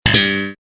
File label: sitcom transition bass riff; seinfeld